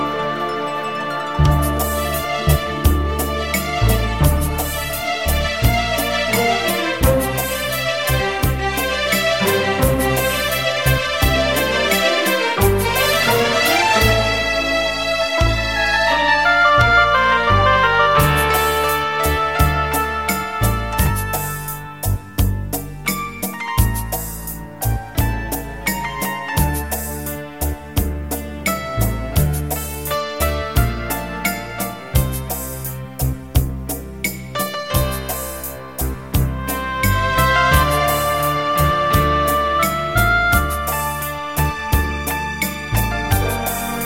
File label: Melody